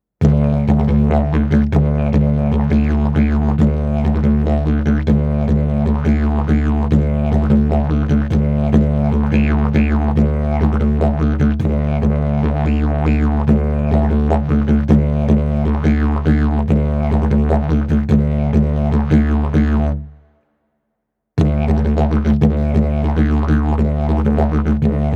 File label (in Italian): ritmo livello intermedio